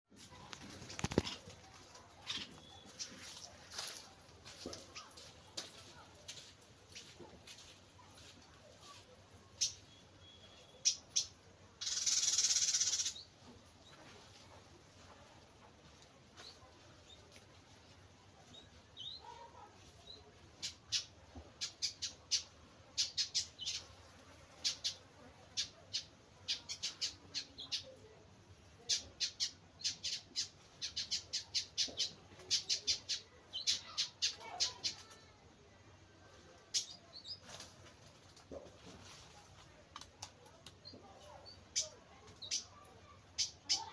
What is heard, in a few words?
birds chirping